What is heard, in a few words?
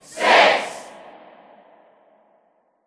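ambience Tennis Crowd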